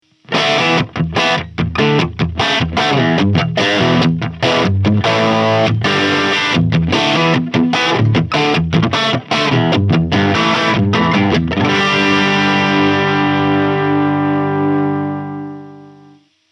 Electro Harmonix MIG 50 Single Coil Crunch Input 2